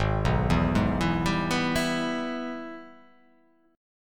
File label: Gsharp Minor Major 7th Sharp 5th; 4,2,2,1,4,2,1,1